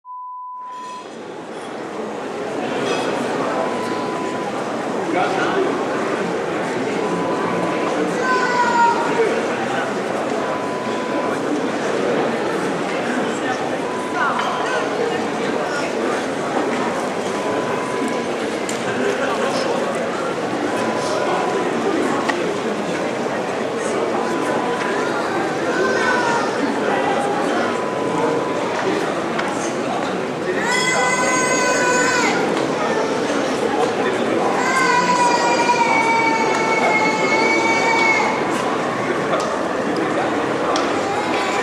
Town Square sound

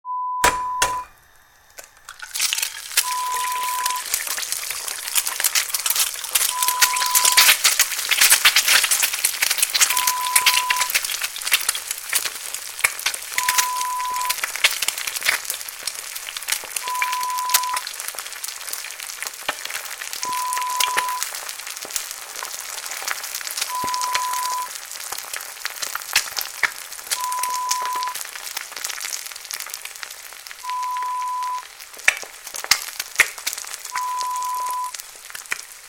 Frying an egg sound

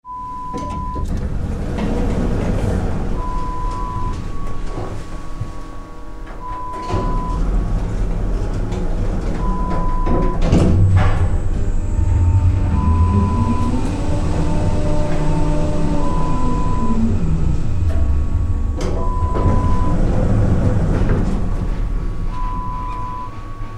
elevator sound

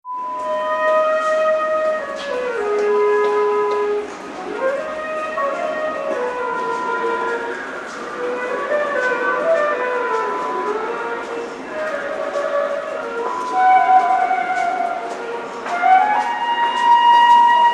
Subway Busker sound

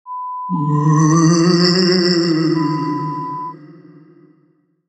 Ghost

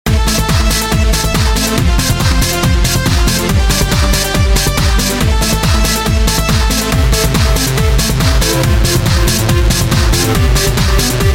Trance Loops

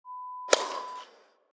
Tennis serve

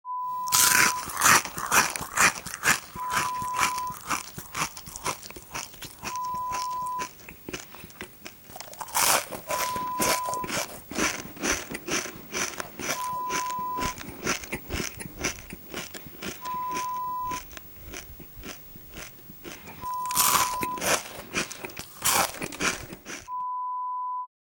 Crunch Chew sound

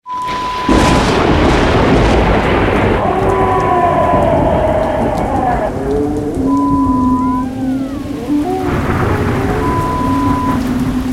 Howling Werewolf Forest; Horror Sound Effect; Lo-Fi

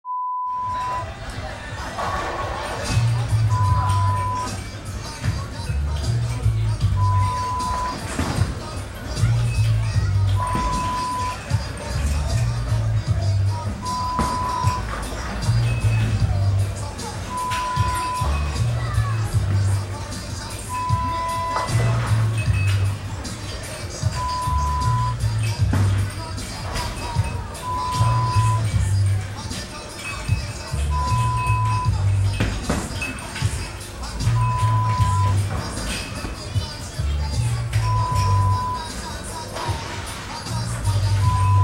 Bowling Alley

